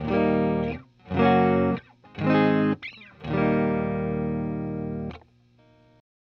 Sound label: Clean chords neck